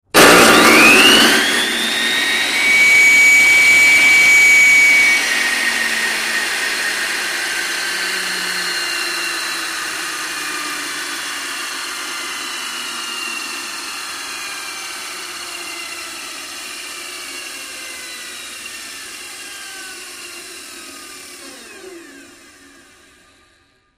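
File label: High Speed Large Table, saw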